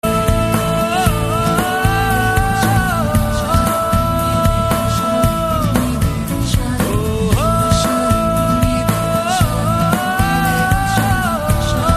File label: Music Sound